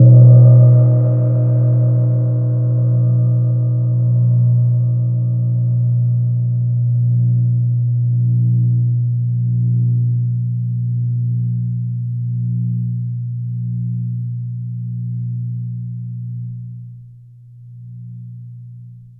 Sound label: gong mono